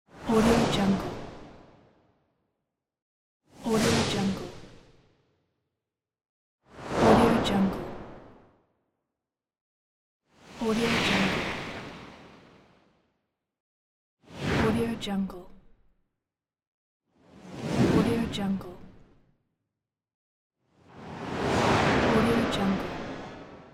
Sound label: whoosh transition; eytan-k